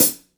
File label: short hihat sound D key; hat samples; Duration 0:01; Samplerate 44100 Hz